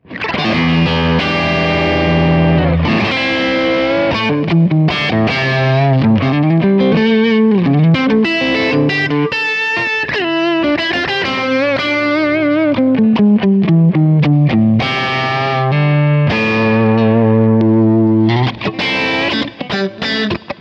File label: Fender Lead II All Positions Crunch